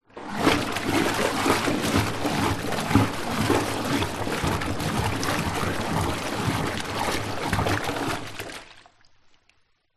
household bathtub water splashes hand to mix make bubbles; zapsplat